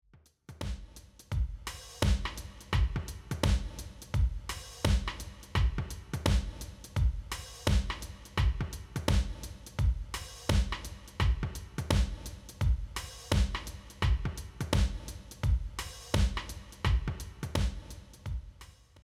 drums reverb

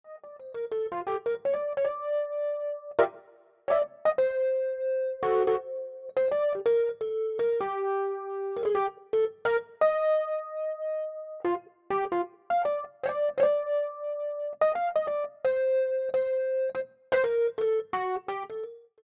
electric piano distortion

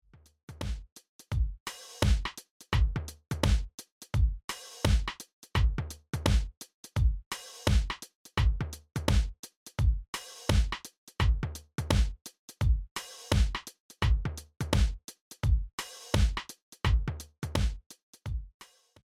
drums dry

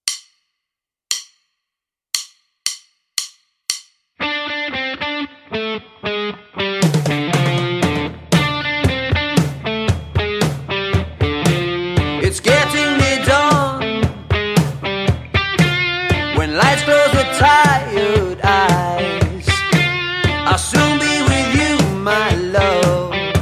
bass backing

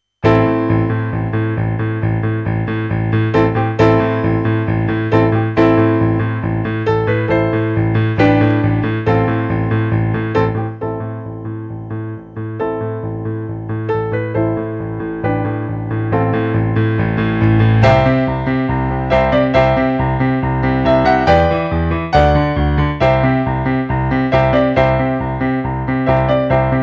accomp

